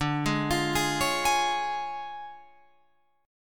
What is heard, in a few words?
D Minor Major 7th Flat 5th, D, down, Guitar Open F; arpeggiated